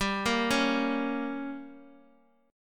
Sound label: G Diminished, Bass Standard; arpeggiated; JGuitar